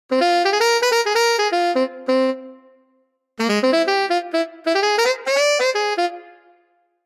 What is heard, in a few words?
tenor saxophone